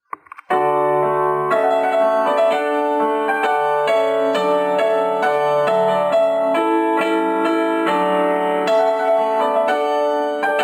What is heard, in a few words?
Trumpet Voluntary